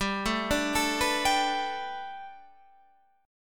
B Minor 7th Sharp 5th G, chord

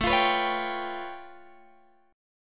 A Augmented 9th A Guitar Open G

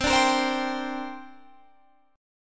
C 7th Suspended 2nd C down Guitar Standard; C7sus2 strummed

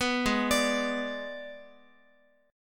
G sharp Diminished G sharp, Guitar; G#dim arpeggiated; JGuitar

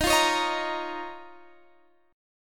D sharp 7th Suspended 2nd, Guitar, Standard; D#7sus2 strummed; JGuitar

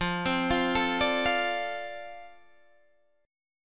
F 6th F down Guitar Open D

